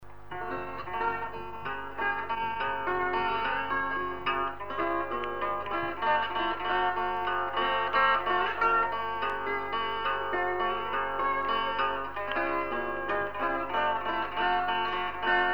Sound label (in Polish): Gitara